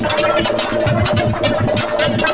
distorter

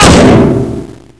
player slam

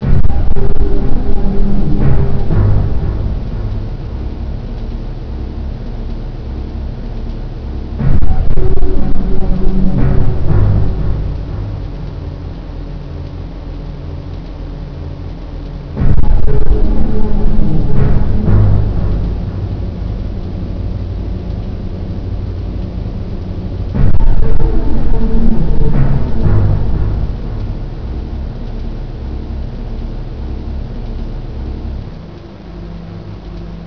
Song

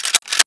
pump, weapons

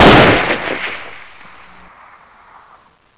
aks fire; paranoia

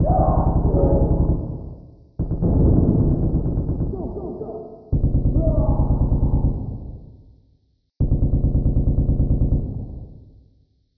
distant fighting

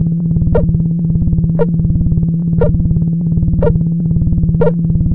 scanner scan loop; scmod

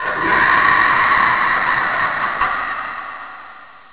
zombie

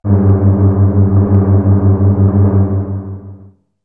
bass

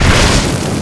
draw, weapons; CSO

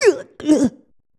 pain; gohan

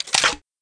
m203 load

